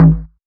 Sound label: Synth Cuts; Vengeance Samples; VES1 Instruments